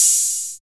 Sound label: loud open high hat b key, Royality free open high-hat tuned to the B note.